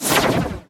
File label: Teleport; Nickelodeon - Capture The Slime